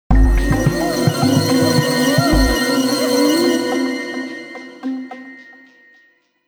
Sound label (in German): Alarm; Modul 2 Stress abbauen